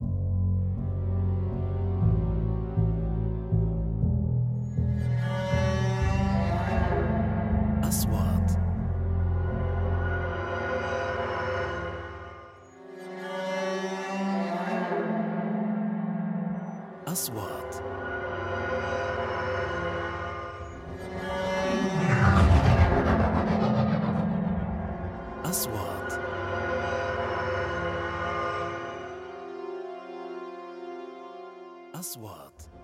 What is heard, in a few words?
music Horror